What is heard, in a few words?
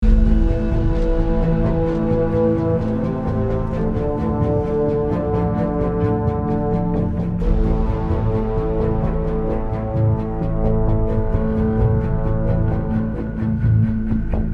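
Music Creepy Explore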